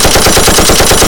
Browning Shoot; Minecraft; Infamy; Last Alive Pack

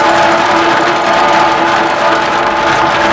metal rain hard loop; ambient, ambience; Left 4 Dead 2

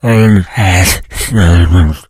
become alert, infected; npc, alert

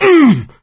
hurt major, survivor voice; Left 4 Dead 2; coach

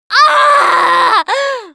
Hurt Critical, russian

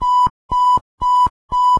radar work; Tank

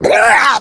female boomer pain short; Left 4 Dead 2

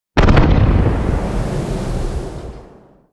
super heavy battleship defend; Hearts of Iron IV Death or Dishonor

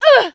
woman hit; Mount&Blade Warband

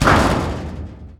office garage door arrive; doors; Counter-Strike Global Offensive

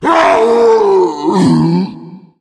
tank death; voice; die; Left 4 Dead 2

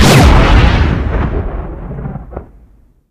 fire, strider; Garry's Mod; hl2

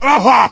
man hit; Mount&Blade Warband

